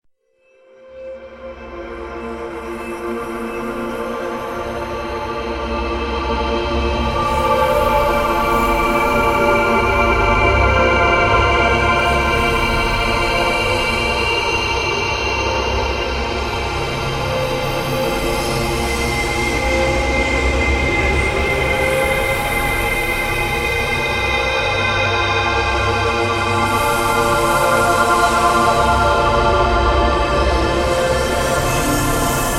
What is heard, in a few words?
Spacepad; RYM 9000